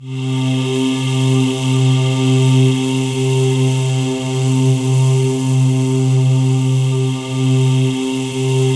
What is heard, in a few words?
C3, Synth Leads